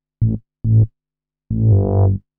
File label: SNTH BASS TEKNO 140 A